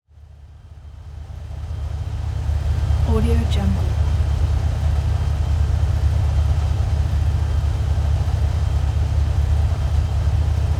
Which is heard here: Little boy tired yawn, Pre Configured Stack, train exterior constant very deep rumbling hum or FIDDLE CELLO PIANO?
train exterior constant very deep rumbling hum